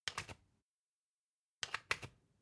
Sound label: usb port metallic in